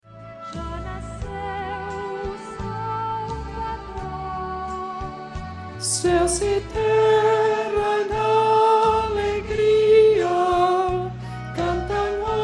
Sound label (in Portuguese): contralto